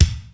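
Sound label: Room kick, Roland JD-990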